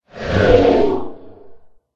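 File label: crystal golem attack voice up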